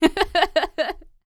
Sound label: LAUGHS; Spectrasonics; Vocal Planet Vol.3 Jazz Fx